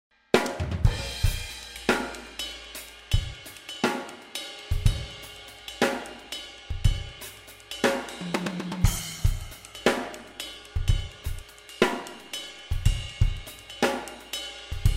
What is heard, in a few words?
ryth